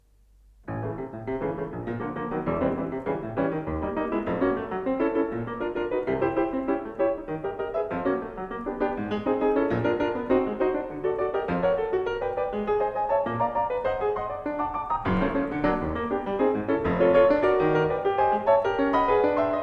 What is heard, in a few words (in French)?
Concerto pour piano et orchestre Allegro moderato